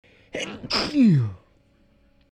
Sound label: sneeze